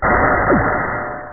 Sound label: hit target; California Games II (1992); Epyx - U.S. Gold; Amiga 8-bit Sampled Voice